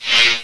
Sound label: electric; Odyssey